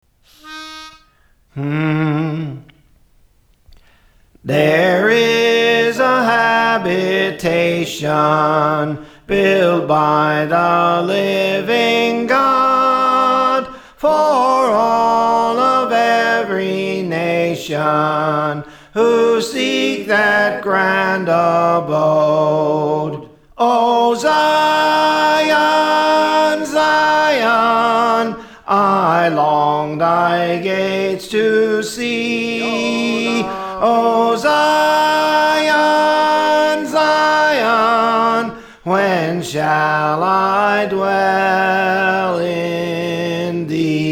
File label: Soprano; There Is a Habitation; Praise For The Lord Training